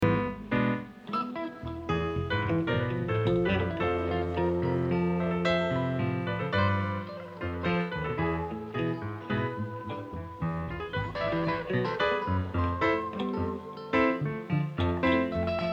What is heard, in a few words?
Spanish Jam